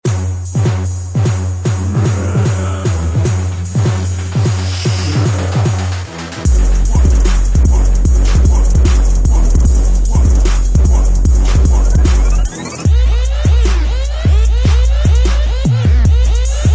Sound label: TRAP; RUN THE TRAP SUPERPACK